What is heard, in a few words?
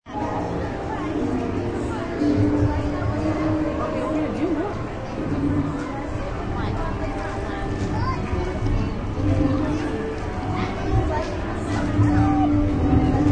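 Smll World, Sounds of crowd and music on kid's ride.; Rides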